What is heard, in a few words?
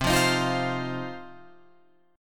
C Minor Double Flat 5th, Guitar, Open F; strummed; JGuitar